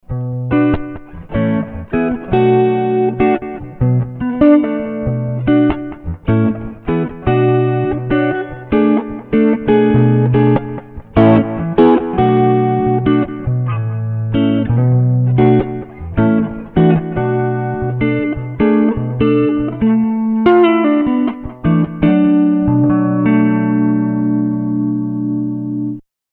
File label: Preset Jazz Clean